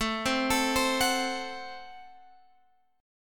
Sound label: A Minor 6th, Guitar, Open G